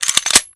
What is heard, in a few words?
casull slide back, weapons; cso